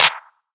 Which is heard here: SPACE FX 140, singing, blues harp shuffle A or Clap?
Clap